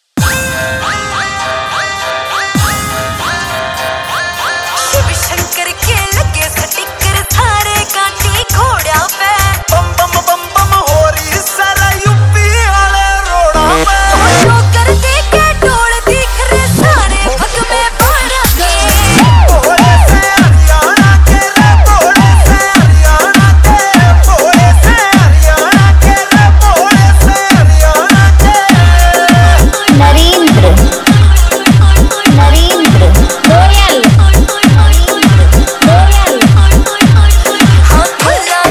Hard Bass Remix

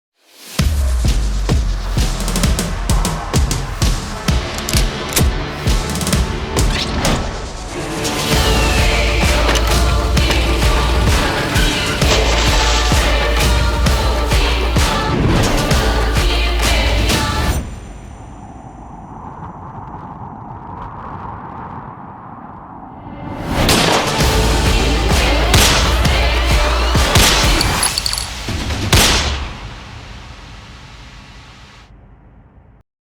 New Haryanvi Song

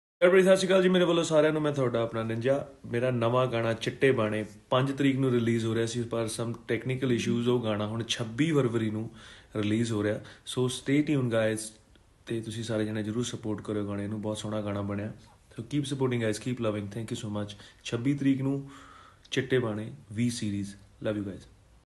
New Punjabi Song

